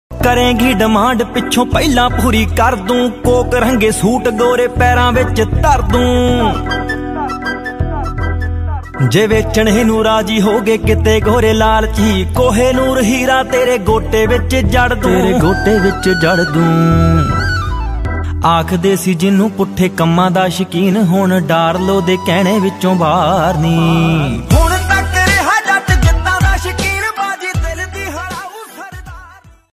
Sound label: Android Phone Ringtone